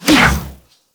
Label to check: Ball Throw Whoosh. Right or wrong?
Right